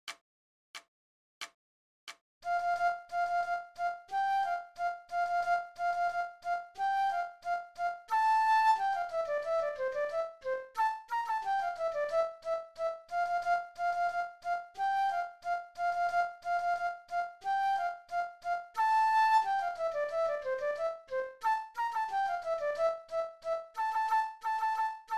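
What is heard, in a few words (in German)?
Floete, 90 bpm; Yorkscher Marsch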